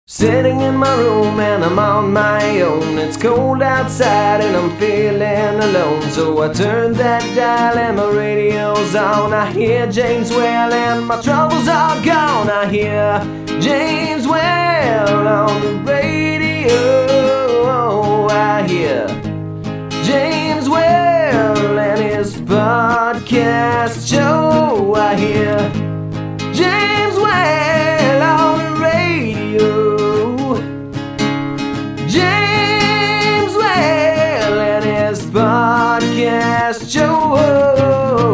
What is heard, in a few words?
jingle; james whale 2015